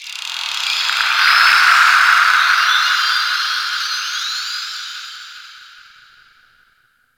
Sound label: Ocean Dolphin High; Ambience; Warframe; Grineer Ocean